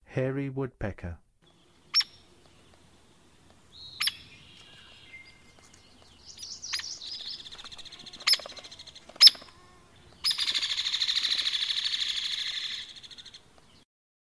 Hairy Woodpecker; North America

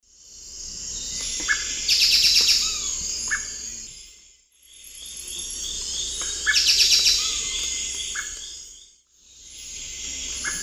Xiphorhynchus elegans, song; S. America; Eastern Lowlands

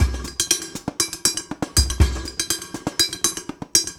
madness

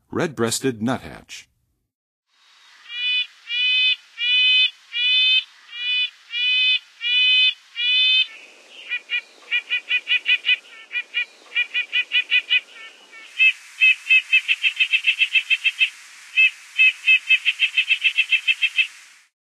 Red-breasted Nuthatch, Songs of Birds; North America; Western USA; Western USA Songbirds

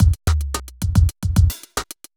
evil beats 110

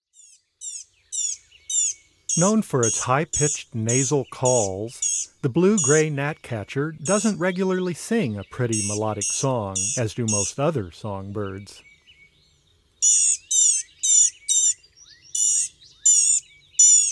Blue-gray Gnatcatcher, Bird Sounds; North America; Common Western Species; Know Your Bird Sounds

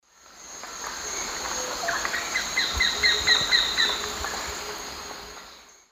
Veniliornis affinis, call; S. America; Eastern Lowlands

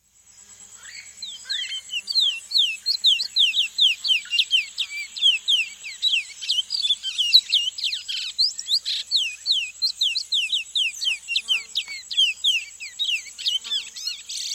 Thick-Billed Seed-Finch, S. America

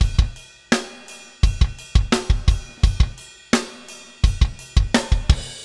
Metal Groove Beat, Rock Drums

